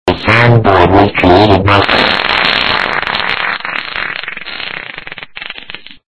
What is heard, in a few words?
c flat fart